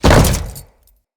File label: Land Default, Footsteps; Grineer